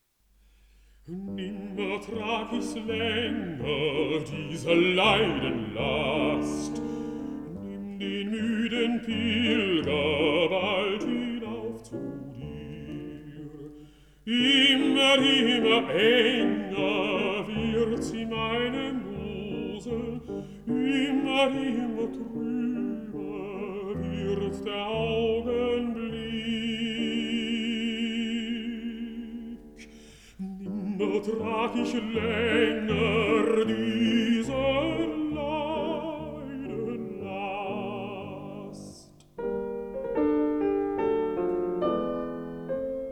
song for voice & piano

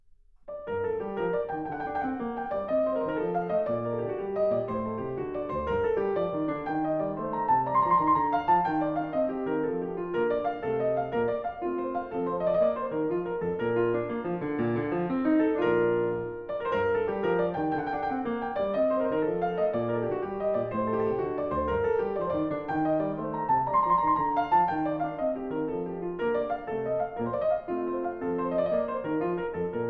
Suite in G minor Gigue, Piano Sonatas and Suites; Suite No. 7

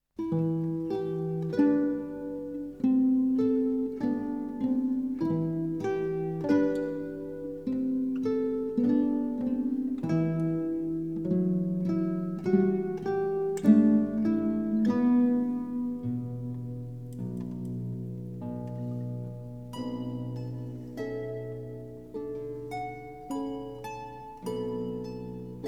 Guitar Quartet

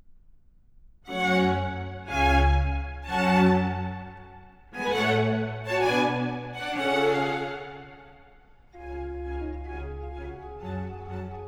Sinfonia for trumpet, strings & continuo Adagio

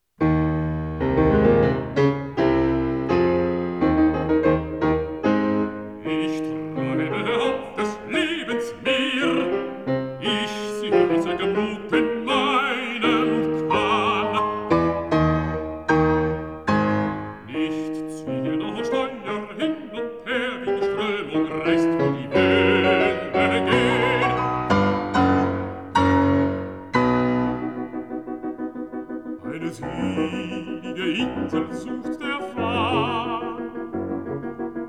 song for voice & piano

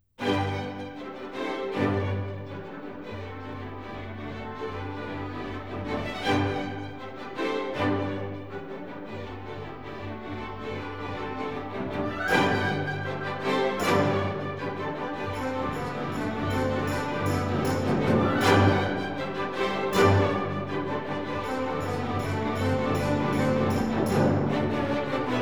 ballet, Trepak (Russian Dance); Telarc Classical; Nutcracker

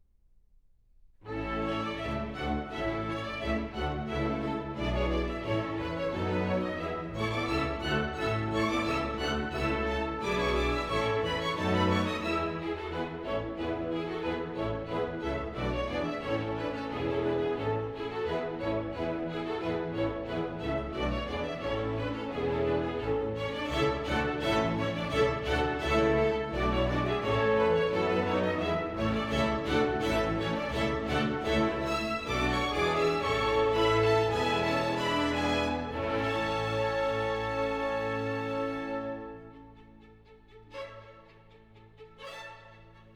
suite for orchestra Prelude; Respighi

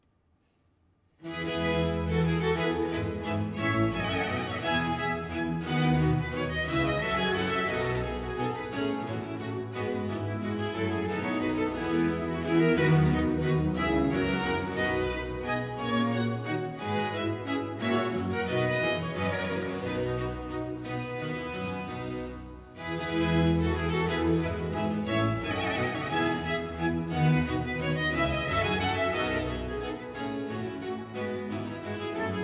Concerto Grosso in F major; Corelli

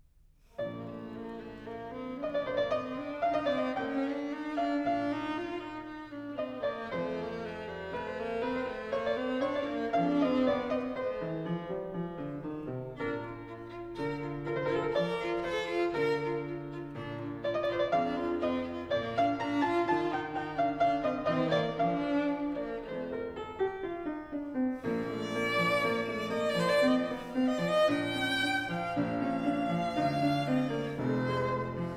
Sonata for violin & piano in F major, Andante; Mozart

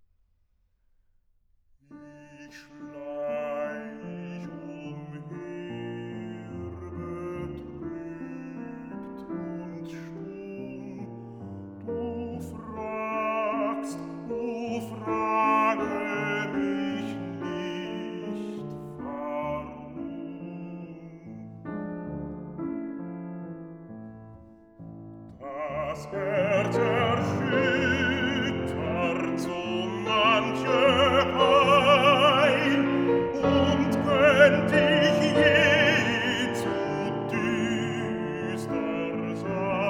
Songs for voice & piano; Ich schleich umher betrubt und stumm; Brahms, Liszt Lieder

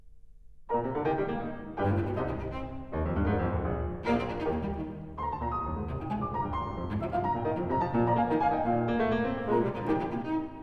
Variations for cello & piano in E flat major

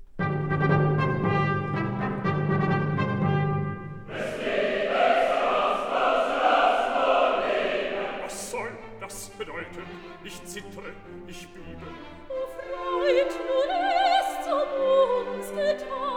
opera

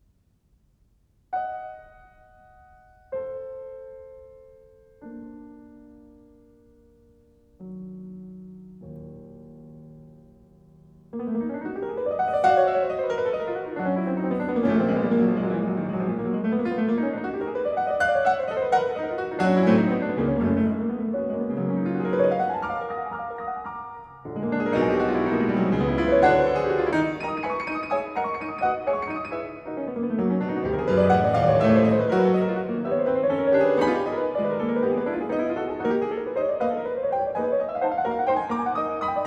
Etudes-Tableaux, for piano, In E Flat Minor; Rachmaninov

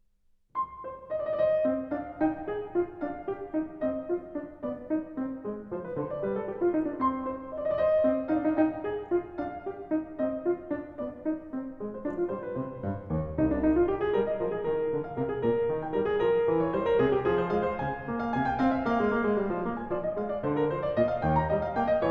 Partita for keyboard in C minor, Rondeau; BWV 826 (BC L2); Bach; Partitas Nos. 2, 3 & 4

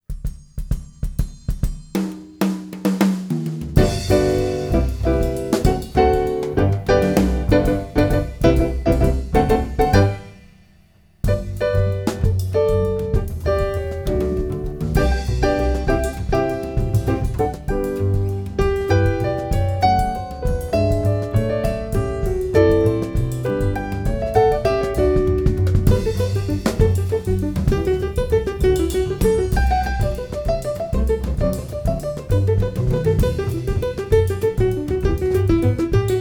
Variations, for keyboard

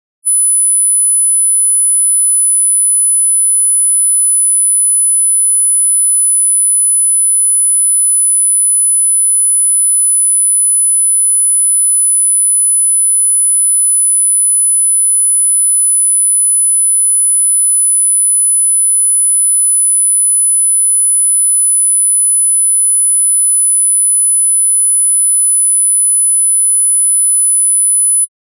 9999hz Sine Wave 0db L; Denon Audio Technical CD

